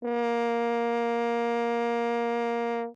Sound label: Horn ff Bb3 stereo; Brass; MIS Pitches - 2014